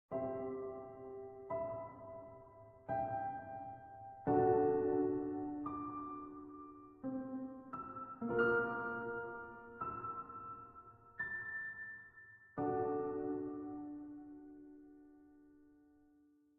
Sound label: The Opening Loading Screen; windscape switch windows xbox one gamerip 2016